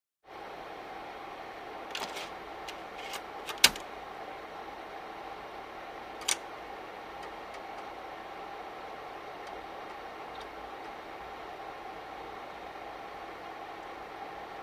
Insert a floppy disk into the floppy drive, read the floppy disk, take it; computer